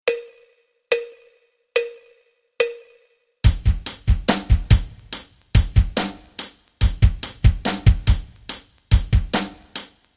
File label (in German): rock rhythmen